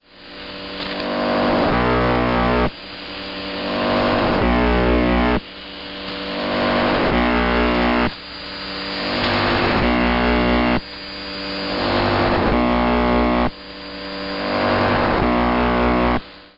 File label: 4625.00 am; TheBuzzer